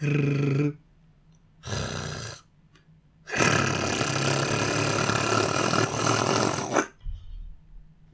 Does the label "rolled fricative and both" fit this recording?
Yes